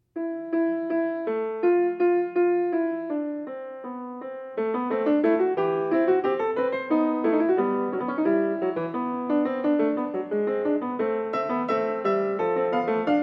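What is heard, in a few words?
Fugue in a, piano; BWV-895; Bach; Prelude & Fugue in a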